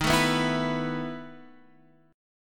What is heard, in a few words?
E Major 7th Suspended 4th Eb, chord